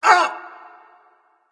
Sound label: grunt hurt; smite; janus